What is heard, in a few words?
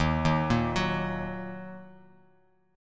D sharp Minor, Bass Standard; arpeggiated; JGuitar